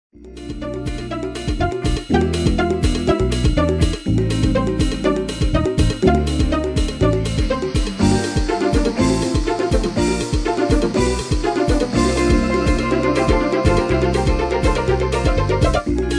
pan meets guitar

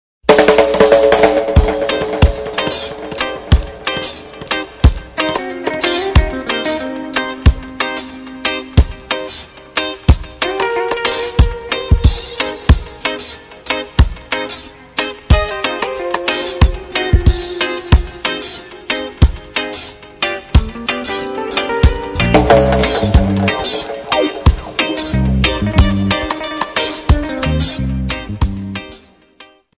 steel dub

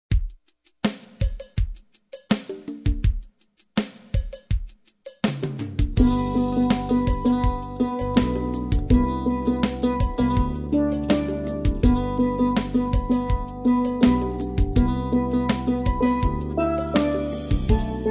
steel pan sax

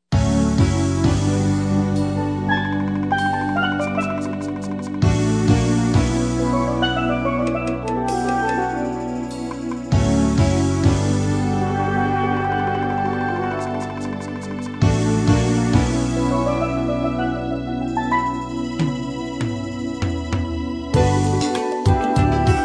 pan meets guitar

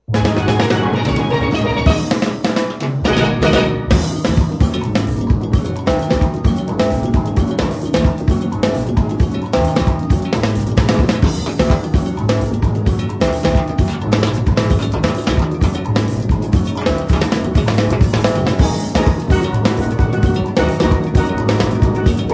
jazz fusion on steel

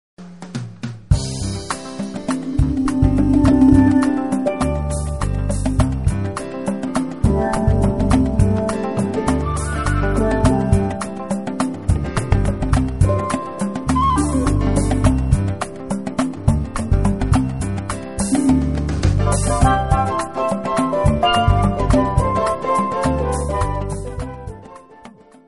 blues

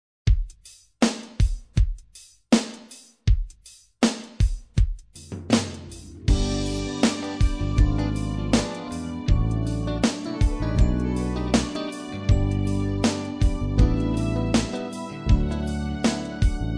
steel pan sax

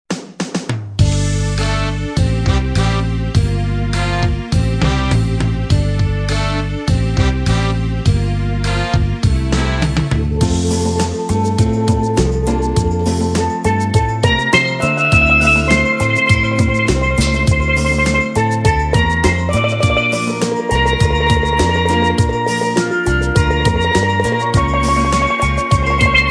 steel and voices

